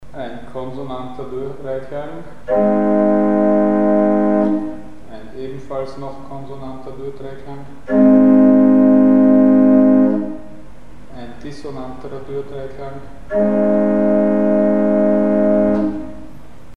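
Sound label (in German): Konsonantere und dissonantere Durdreiklänge; Mikrobuch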